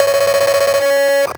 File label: 8Bit Fx; Antidote Advent